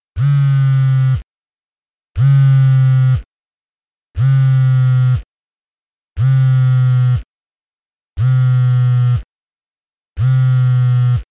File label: Vibrating